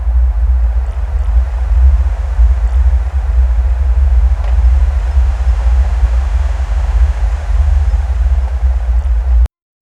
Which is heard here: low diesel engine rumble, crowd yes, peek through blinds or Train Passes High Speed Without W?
low diesel engine rumble